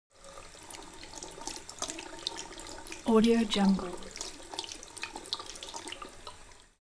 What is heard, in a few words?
elements water sink soft flow wash; smartsoundfx